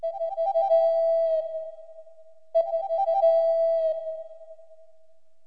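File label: ocarina; Roland; An Invitation To The Roland World Of Music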